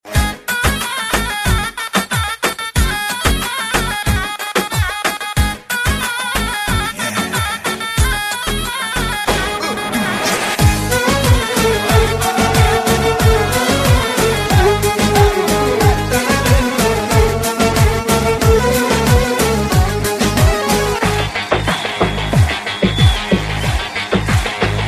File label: Arabic Classic Ringtone